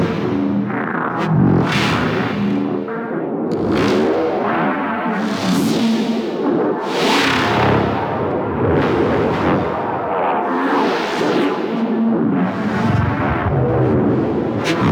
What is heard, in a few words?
Tether Loop; Ship Power; Warframe; RailJack